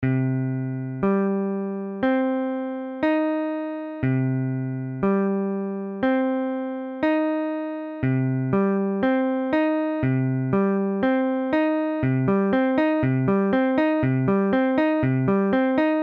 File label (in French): Cm, guitare